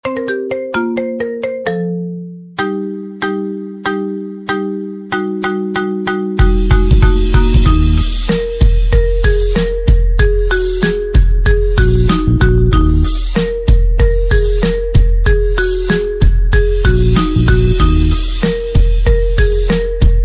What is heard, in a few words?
marimba